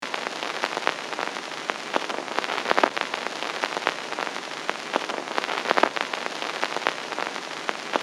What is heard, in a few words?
Vinyl record player noise; gfxsounds